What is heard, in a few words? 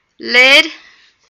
lid